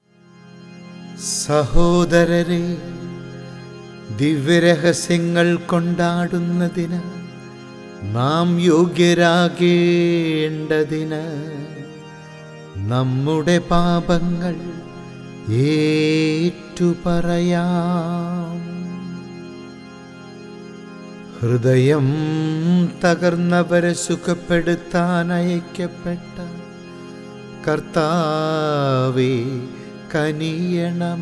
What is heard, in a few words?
Chant; Sahodarare DivyaRahasya; ordo cantus